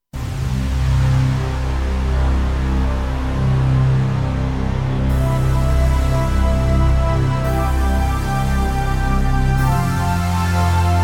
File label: Analog Game Open